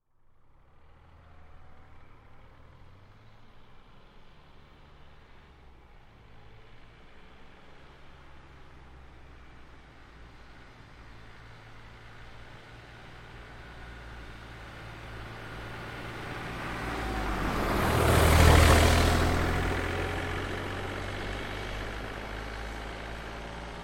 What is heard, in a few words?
Car Smart Car By Slow; 2005 Smartcar Fortwo; BigRoomSound